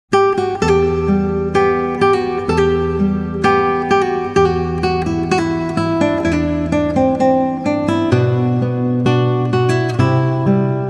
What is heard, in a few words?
Guitar Instrumental